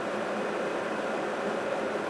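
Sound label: pipe leak small; Star Wars Knights Of The Old Republic II; XBOX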